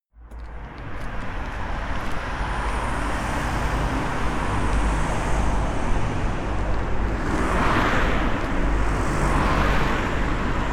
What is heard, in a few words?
walking down the street